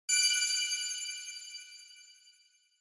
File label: Onoma Sparkle Fast